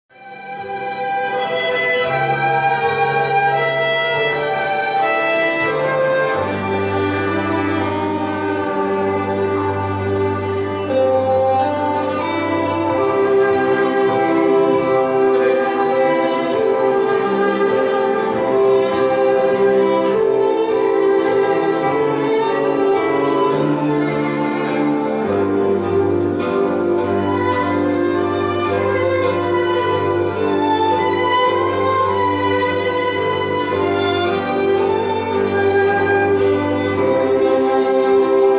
QUARTETO DE CORDAS E TECLADO

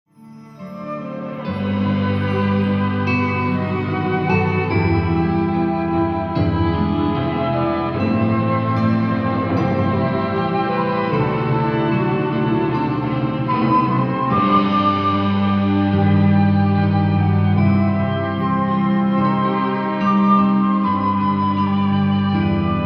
ORQUESTRA

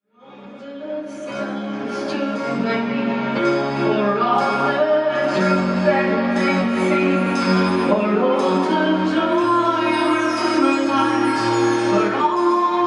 SOPRANO E ORQUESTRA

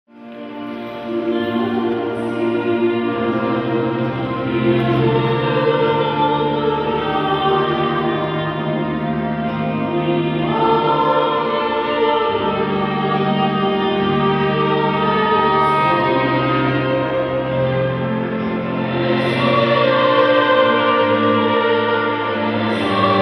SOPRANO E ORQUESTRA

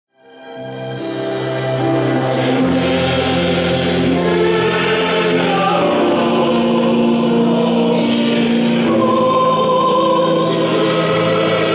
CORAL E ORQUESTRA

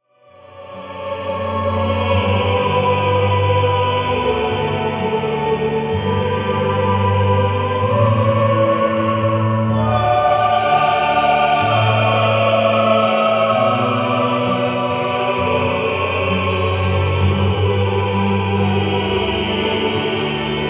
CANON CORAL E ORQUESTRA; PACHEBEL

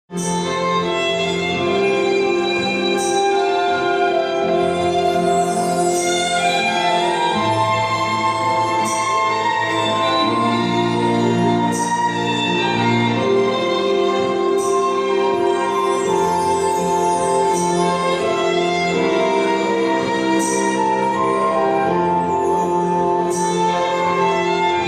ORQUESTRA

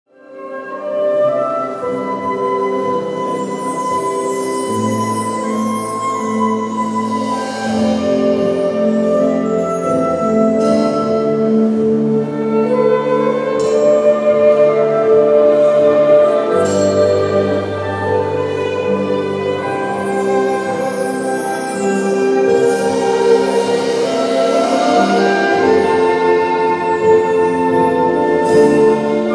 ORQUESTRA

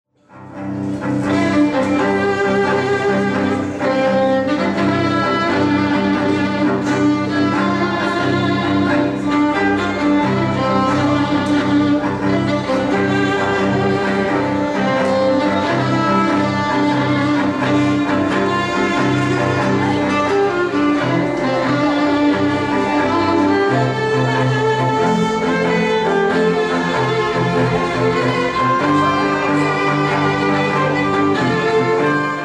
QUARTETO DE CORDAS

